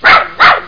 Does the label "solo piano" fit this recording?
No